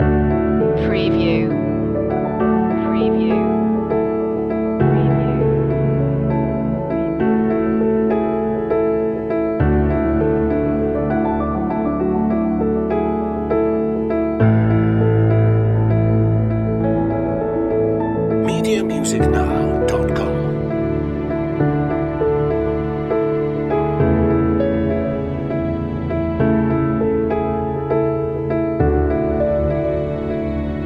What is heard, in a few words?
angels and hope loop